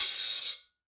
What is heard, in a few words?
Guiro C Long; Latin Percussion; Drum Hits; Wood; Ableton; Factory Packs